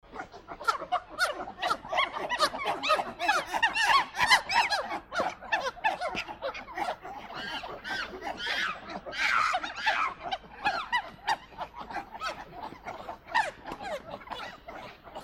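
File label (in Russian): обезьяны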